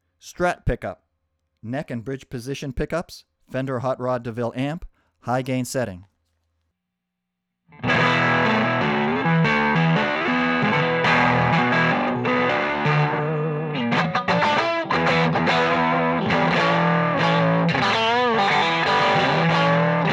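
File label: strat neck bridge hot high, guitars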